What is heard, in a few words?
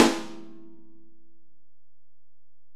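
Acoustic Snare, Alesis DM-PRO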